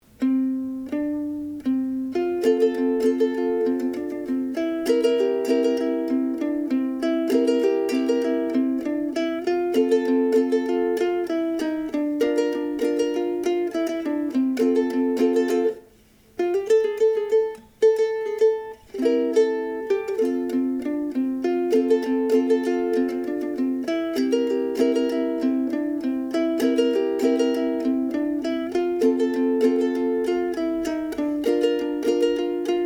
Uke; Down by the Bay

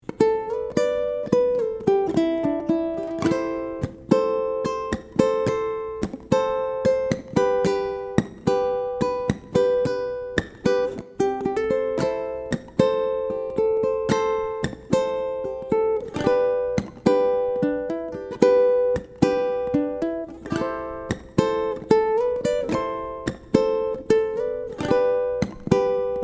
2 chord jam

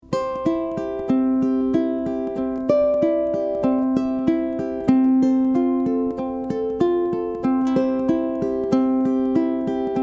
fingerpicking

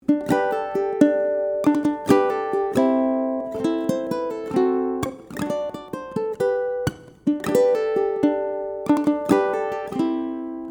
Uke

